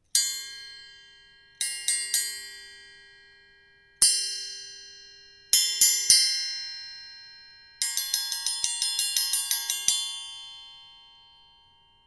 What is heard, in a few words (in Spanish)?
Triangulo